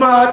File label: Vocal Oneshots; Vengeance Samples; Vocals